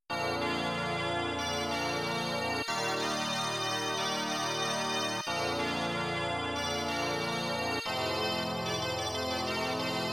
kirche; harvest moon

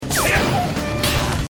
Schuss und Schlag; arms

